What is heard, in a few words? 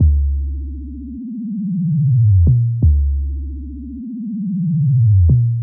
Hammer Bass, Drum & Bass; Fluid Dynamics